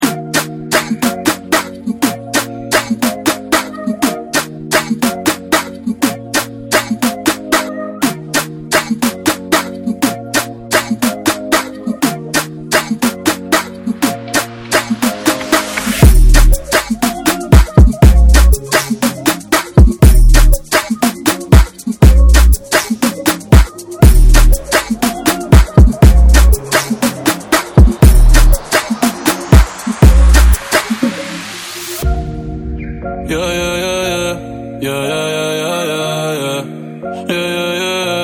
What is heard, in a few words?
Baile Funk; BlackNoise; Redrum